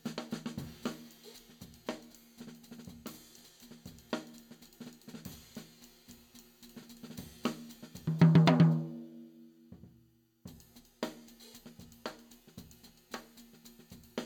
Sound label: Tom